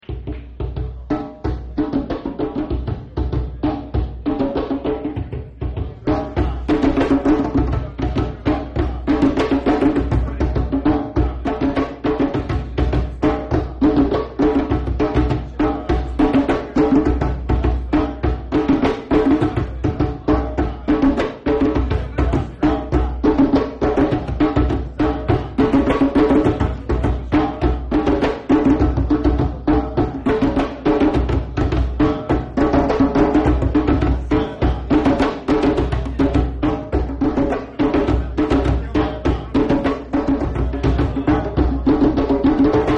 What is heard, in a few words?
africa drums